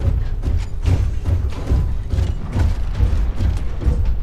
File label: Legion Walk Loop; Titanfall 2